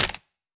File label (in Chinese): 红树根踩踏声